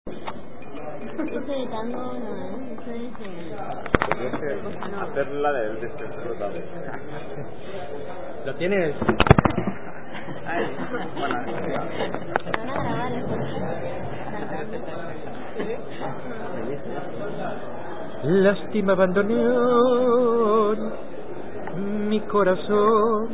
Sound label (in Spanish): canto